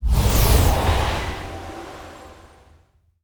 wind magic

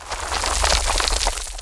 rock hit

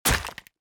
ice axe

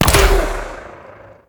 launcher cast

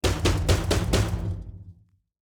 knock door fast

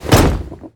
bowl smash

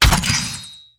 sickle

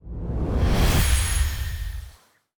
magic change

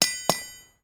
gold bar hit

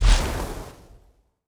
card shining

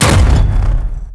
tiger punch

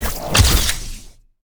flash

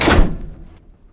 tank

